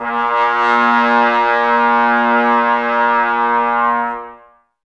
brass, Tbs Mute

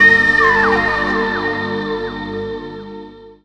Connected Flute; M8

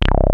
BASS, BASS STATION; Syntec; Wall of Sounds

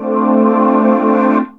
Korg, FX

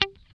GUI G4, E-GUI MUTED; Sound & Vision; Gigapack I CD 2

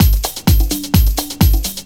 Latin Loop 128 BPM

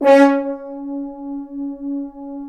BRS, FHns Accent; Roland L-CD702; Accent-Swell

